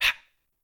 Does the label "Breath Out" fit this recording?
Yes